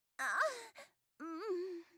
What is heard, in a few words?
moaning; torchlight